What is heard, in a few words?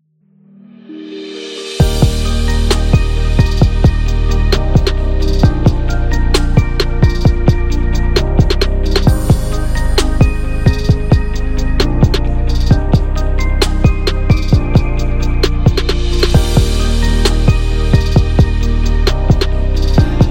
Marketing Digital